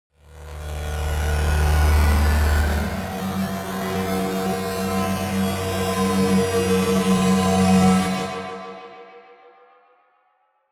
simple swell bass

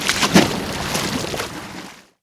pouring water in a glass

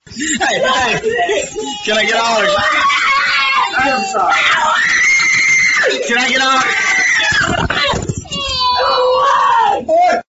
video gamer boy screaming

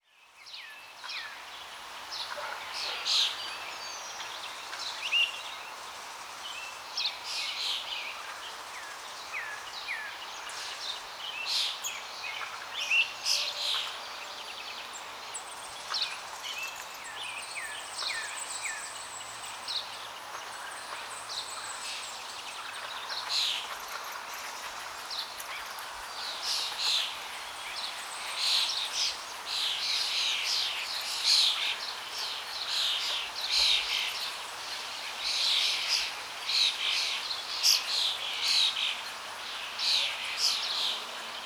natural ambience with flowing water and birds

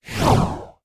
fast air sweep transition

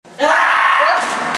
loud scream

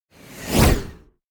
high speed

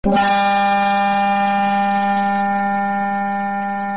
lobotomy sound

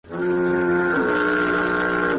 womp womp tuba

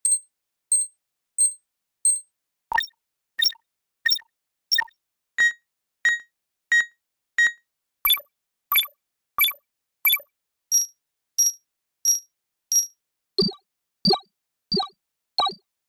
data processing sfx

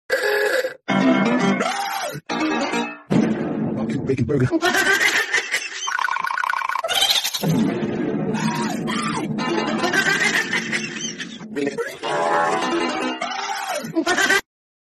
goofy ahh ohio music

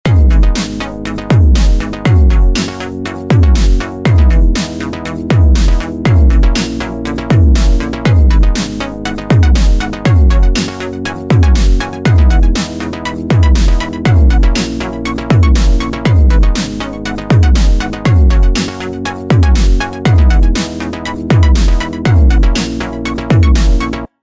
happy video game music

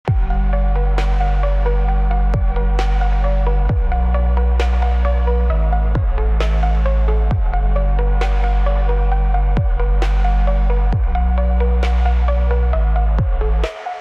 modern and futuristic beats

